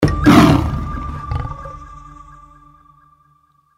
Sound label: symbol lion; Stampede Call of the Pride; Eyecon